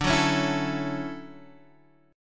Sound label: D-Major 9th, D, Guitar, Open D